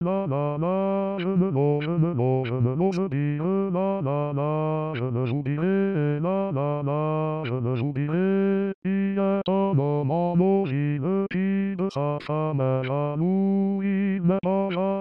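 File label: bass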